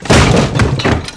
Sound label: wood crate break; physics